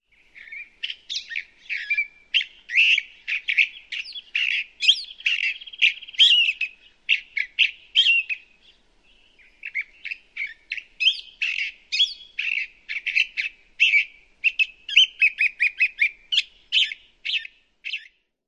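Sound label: California Thrasher song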